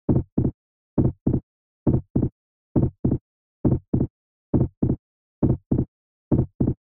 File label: Heartbeat Mid Loop